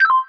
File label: remove battery; ohms law